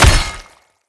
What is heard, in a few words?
Great Sword Impact Flesh; Weapon Impacts; Founders Great Sword; Combat